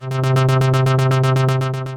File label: Thrasher Pad, Synth Pad; Ableton; Factory Packs; Samplification